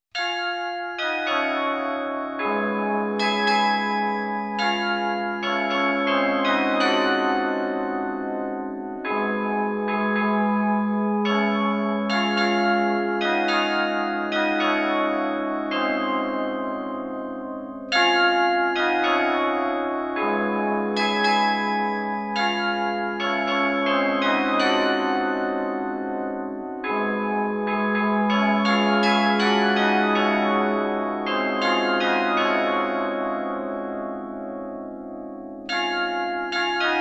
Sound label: Glockenspiel; Va Pensiero; GRASSMAYR